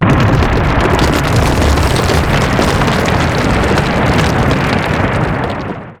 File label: earth split; dota; elder titan